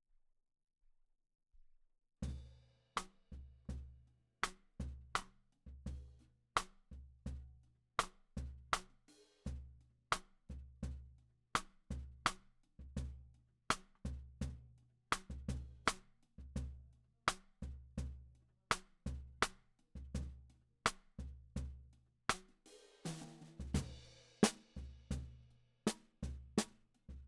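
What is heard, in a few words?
Snare Bottom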